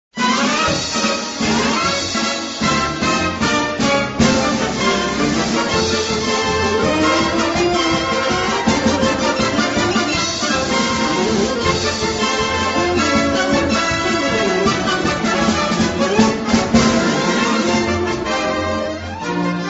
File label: tom and jerry ringtone; tom and jerry opening